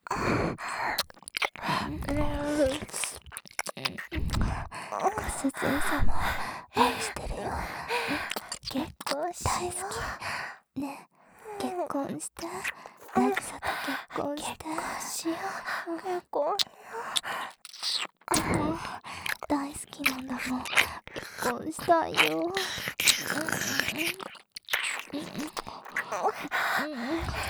耳舐め 喘ぎ; シャルロッテ

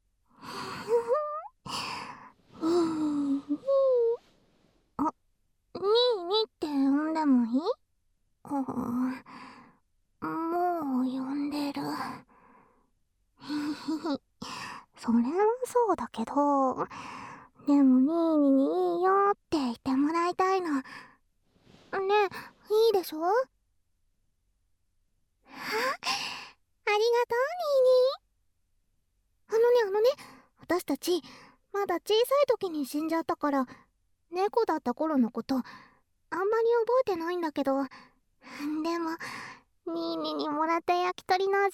反転 蕩ける耳舐めマッサージ

